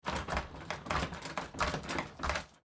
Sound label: Door Weather Strip, Door Weather Strip Door Handle Turn Locked; Blastwave